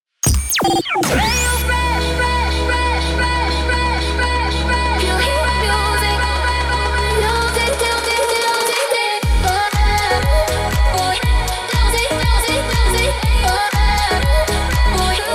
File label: Jingle Chante long Pop